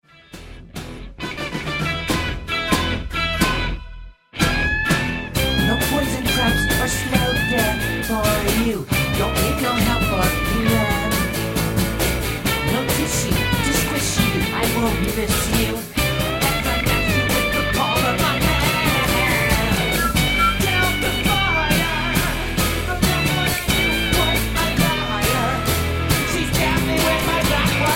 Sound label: NO acoustics cabasa